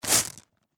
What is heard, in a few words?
Bag Marbles Up, Foley, Plastic, Pick; Toy